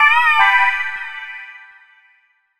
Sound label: Floating Alert, phonetones; Sony Ericsson; W508; PlusFourSix; Brittany; Lars Edvall